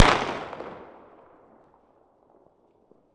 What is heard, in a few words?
firecracker explosion echo reverb city concrete walls